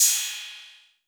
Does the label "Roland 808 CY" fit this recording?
Yes